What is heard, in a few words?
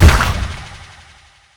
nose dive impact; viper